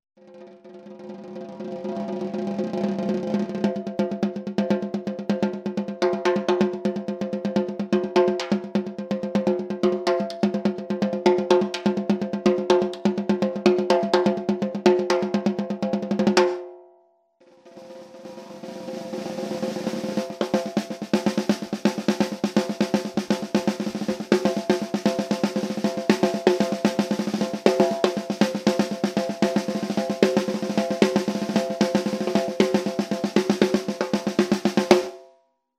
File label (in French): caisse claire